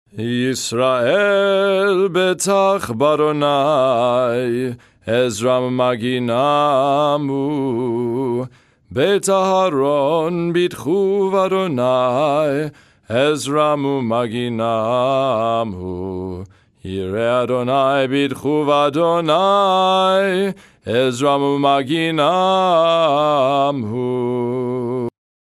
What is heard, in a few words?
nusach ukrainian dorian; psalm 115 v.1 11; Festival Shaharit (Lower Voice)